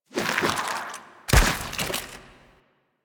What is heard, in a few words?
Idle Agile; Warframe; Broken Frame